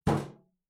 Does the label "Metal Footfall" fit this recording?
Yes